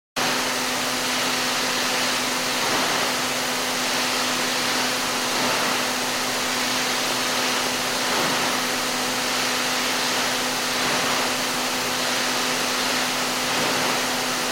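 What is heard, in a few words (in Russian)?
аудиокассета шум пленки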